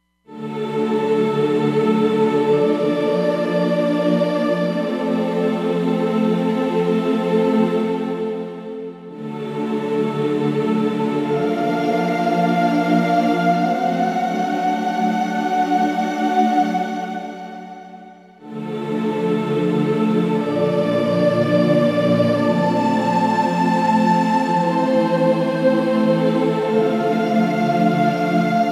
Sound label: Aerial Choir, synth